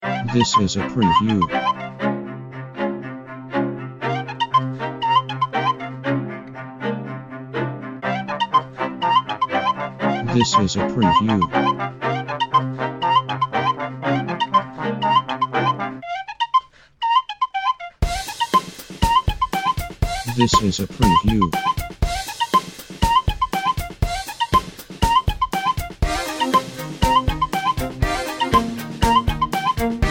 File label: Action Adventuring Epic Song